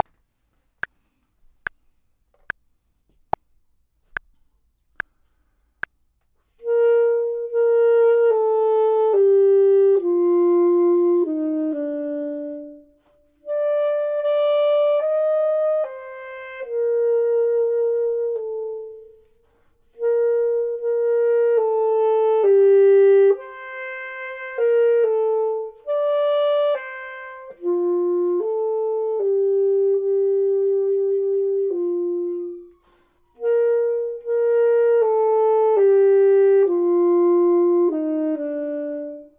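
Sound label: top, Saxophone and Clarinet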